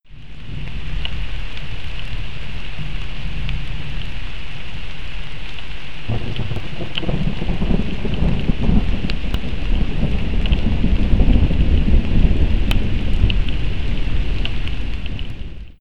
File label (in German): Dorfrand Wiese Wald Sound Donner mit Regen